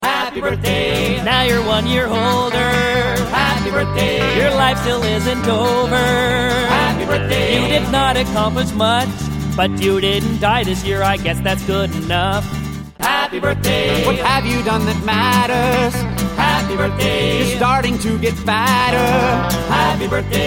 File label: happy birthday funny